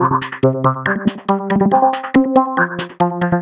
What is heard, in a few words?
synth 140 grunge C F G; Transmission-X